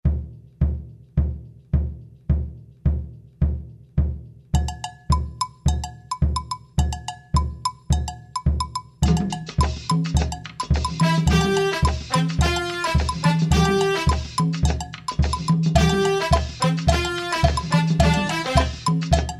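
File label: Samba & Brass; Alesis Z7 Latin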